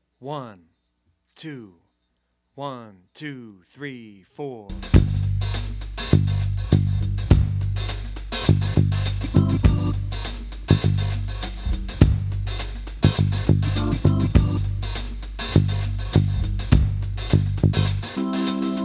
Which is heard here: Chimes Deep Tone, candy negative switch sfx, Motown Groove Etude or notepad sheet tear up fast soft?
Motown Groove Etude